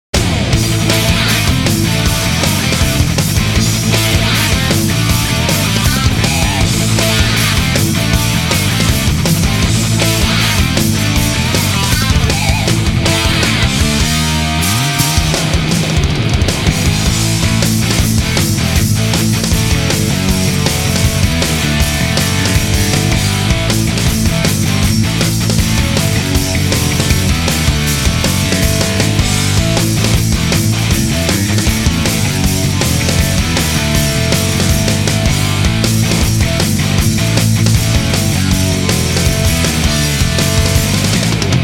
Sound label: No Vocals; Sonic Adventure